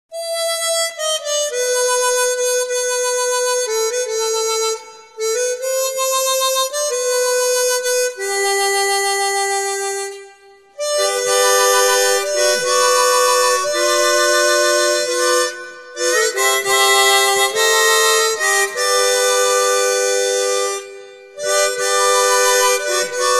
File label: Chromatic Harmonica, Favorite Hymns